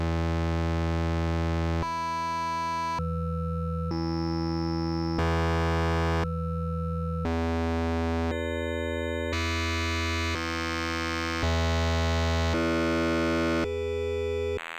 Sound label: Waves; Behringer Victor